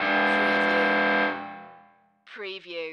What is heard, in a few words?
Buzzer Arena Stadium